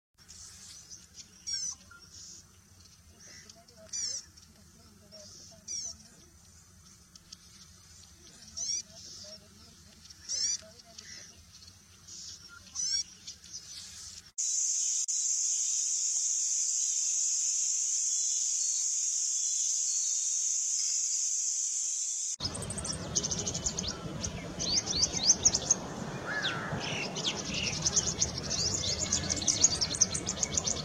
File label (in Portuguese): Canto da felosa agricola